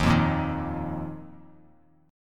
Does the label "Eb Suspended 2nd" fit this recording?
Yes